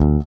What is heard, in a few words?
Single Bass note